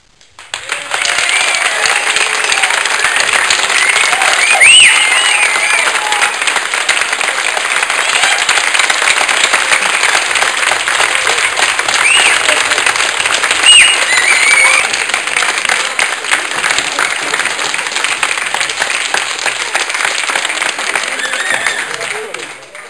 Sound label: Applause, Cheers and Whistles; Soundsation; Human